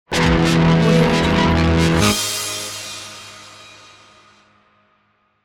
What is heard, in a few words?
intense glitch